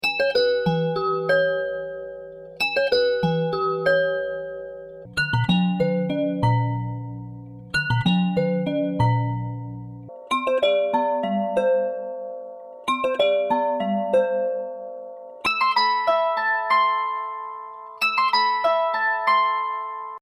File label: invert spect